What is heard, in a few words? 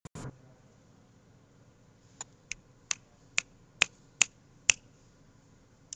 snapping